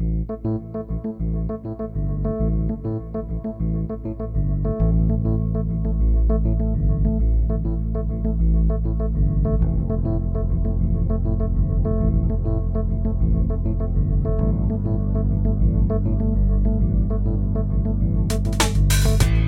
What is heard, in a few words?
Race Music StartLine Loop; gear club